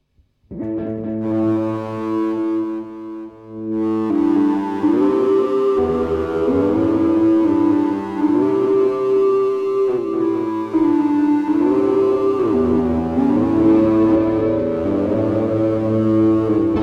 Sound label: Test Ebow